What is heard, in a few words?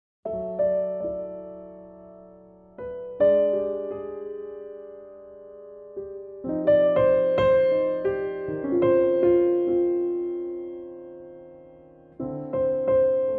C piano